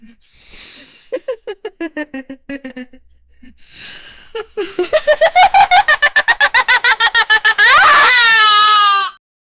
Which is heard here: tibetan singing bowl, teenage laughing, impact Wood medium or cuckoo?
teenage laughing